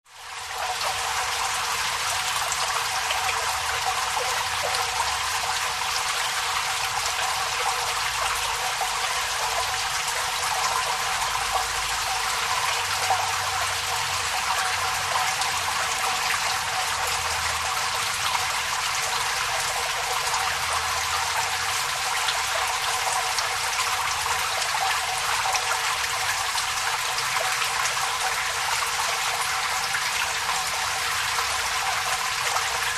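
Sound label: Resonant Water Dripping, drips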